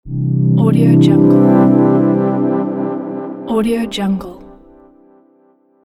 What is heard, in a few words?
opener starter fade